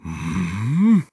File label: Hmmmm; Gaepora: "Hmmmm..?"; Skyward Sword